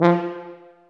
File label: TUBA C, stacc; Roland L-CDX-03 Disk 2